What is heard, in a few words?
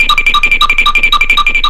cartoon tiptoeing